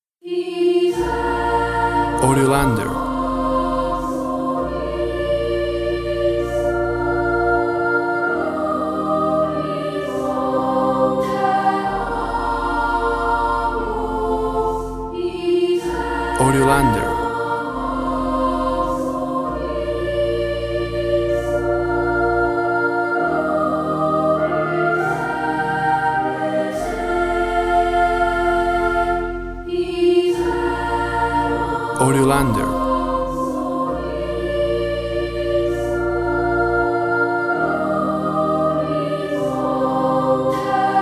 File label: Pastorale Choir